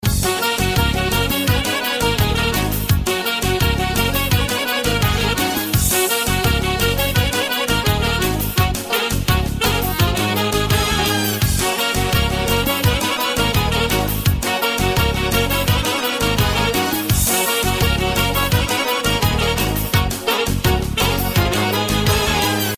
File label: Turbo Folk